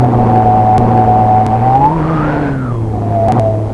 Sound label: WIND